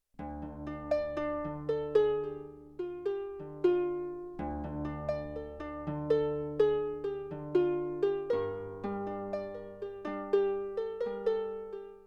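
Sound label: Alternate Random Detune, Harp, Yamaha TX16W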